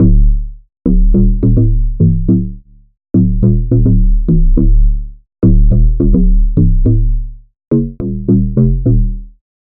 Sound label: Bass; Fredo Corleone; Routed Sound; Spire Expansion - Noble Gangster Vol.1